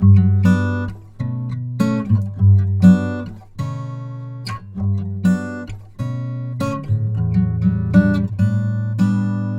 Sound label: Xmass Guitar Loops 100bpm Bm; Guitars; Ghosthack; Advent Calendar 2019